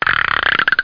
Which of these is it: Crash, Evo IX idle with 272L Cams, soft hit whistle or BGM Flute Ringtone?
Crash